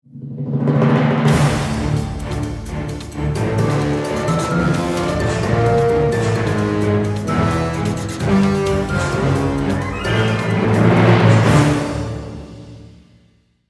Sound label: Ringtone; Masterminds